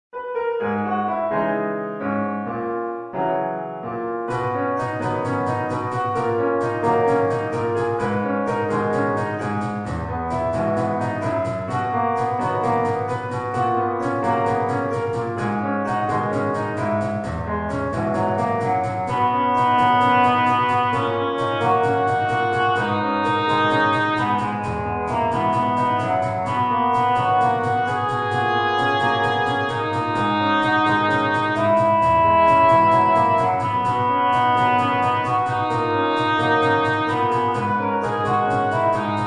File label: Hand Drum with Piano and Voice, Midi